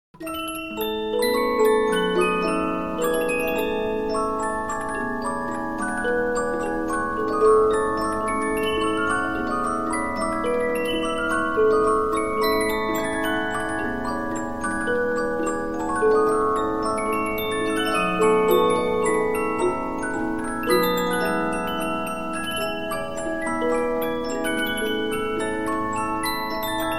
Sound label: Polyphon, 15inch disc musicbox